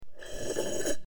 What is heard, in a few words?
Slurping soda